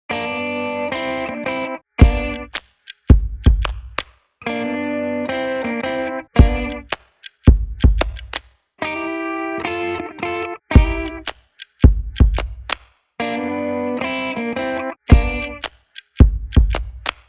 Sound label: Menu Music; Fly O'Clock